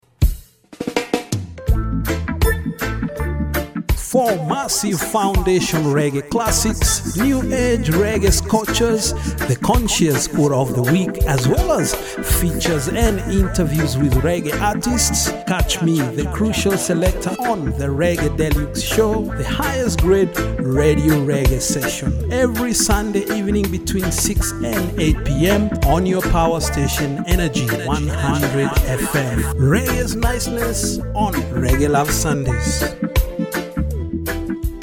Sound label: Reggae Deluxe Energy 100; Promo